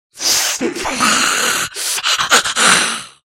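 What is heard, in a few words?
sounds of goblin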